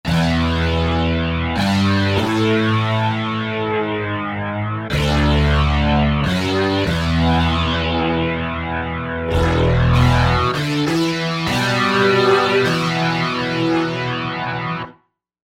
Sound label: Grungeroni